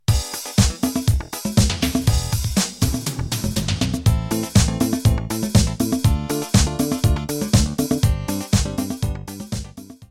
qy8 120bpm

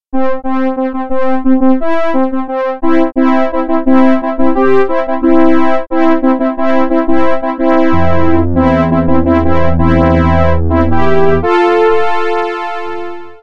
horn section

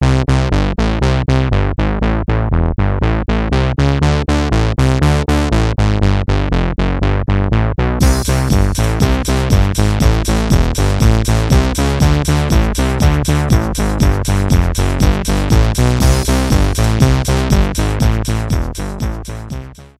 acid bass

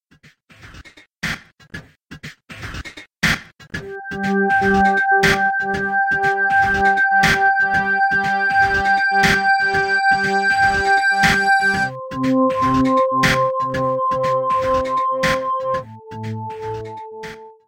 ramp mix

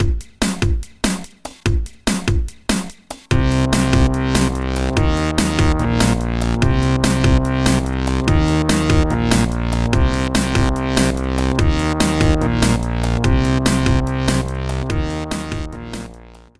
saw mix

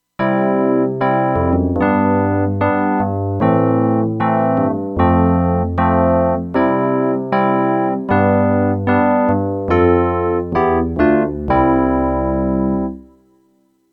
wurlitzer

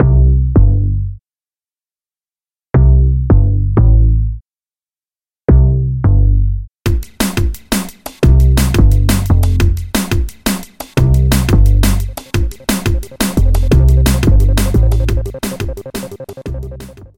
wide one bass

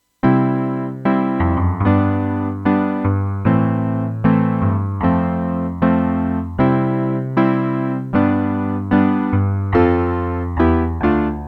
steinway

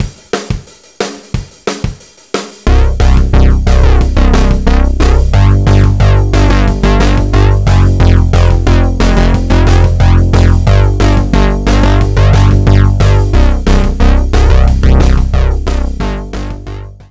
pulse bass

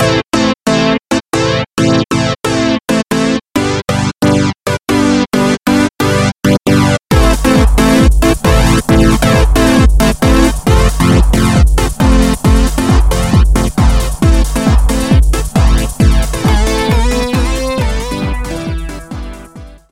acid lead

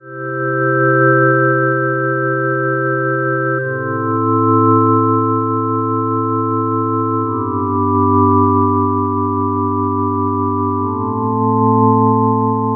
soft pad

